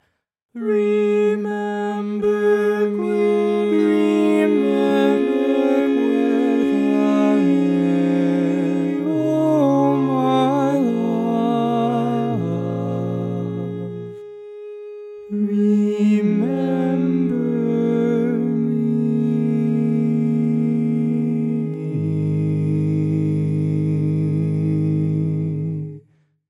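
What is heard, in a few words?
Baritone, barbershop tags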